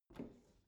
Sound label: bathroom cabinet open soft smooth; objekte; tueren